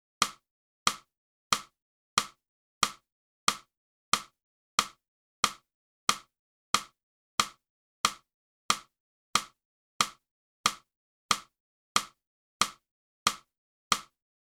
clarinet; boosey method

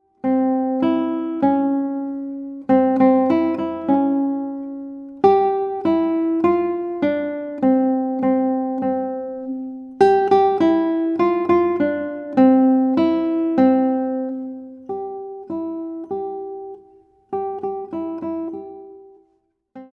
Guitar

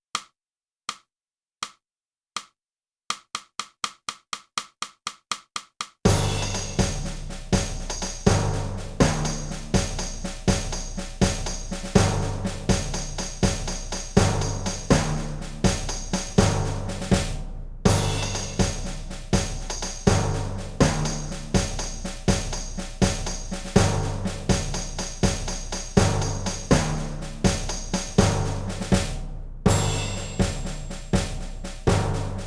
flute; (Morgan) - Boosey Woodwind Method: Flute (Book 2) (Book & CD)

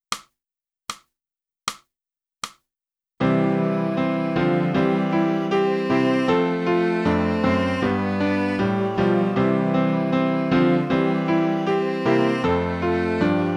flute; boosey

